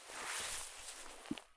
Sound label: prone grass low; Escape from Tarkov